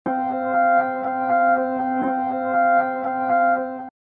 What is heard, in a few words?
Loop